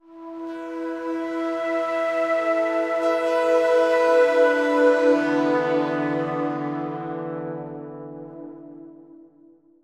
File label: Synth E Long